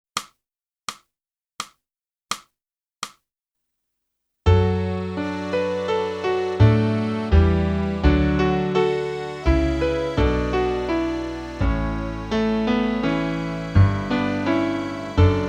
flute; boosey

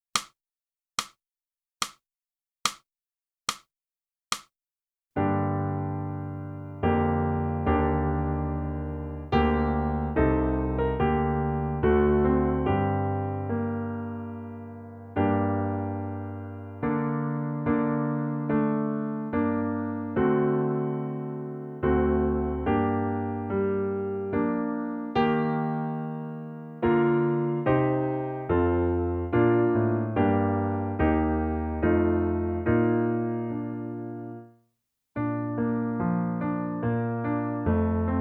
clarinet

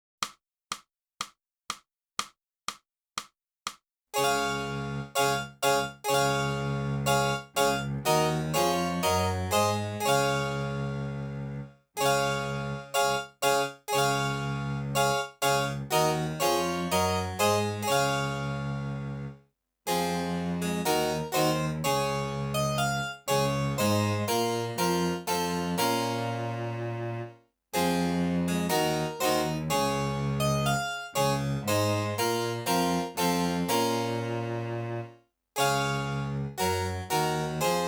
sax pavan

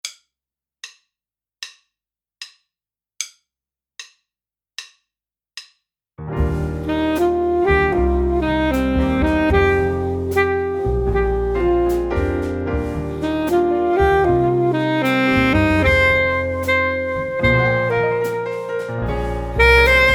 Full Sax

